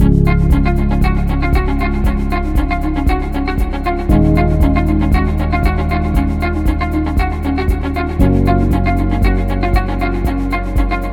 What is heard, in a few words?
Corporate Simple Motivation; corporate music vol 2